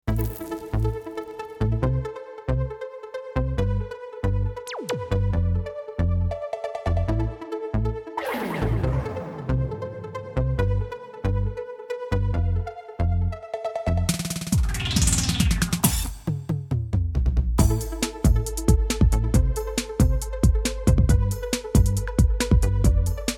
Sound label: rhythm